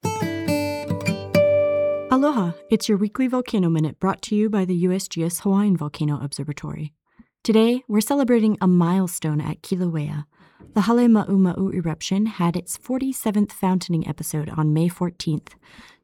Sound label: guitar intro; HVO Radio; Kilauea; Halemaumau eruption